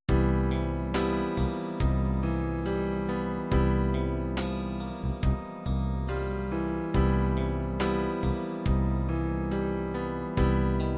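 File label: ballad piano ballad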